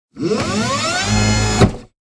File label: Robotic Movement; SoundsCrate